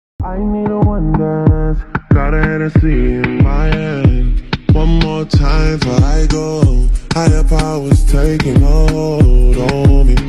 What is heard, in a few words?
remix english ringtone